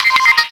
Shutter; L601i SIMPURE L1; LG

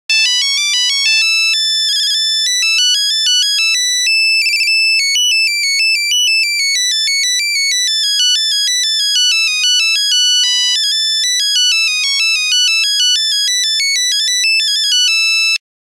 Nokia 6210 Bach, Nokia Buzzer; Windows Phone apps

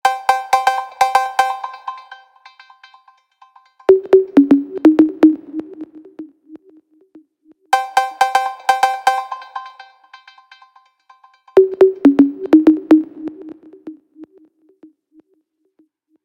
Trill, ringtones; Nokia; X

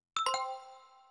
call end; ZTE Nubia Z5; ui

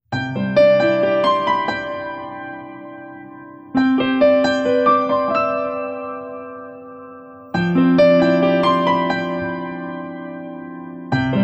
Piano, alarms; Tecno Boom J8

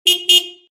Car Honking; Tecno Spark 40C

